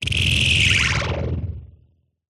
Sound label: Blasts Synth Delayed Oneshot; sound spark